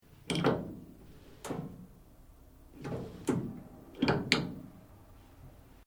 SHOWER SYSTEM JAPANESE TEMPERATURE DIAL, Japanese bathroom appliance; Bathroom Sound Effects; Household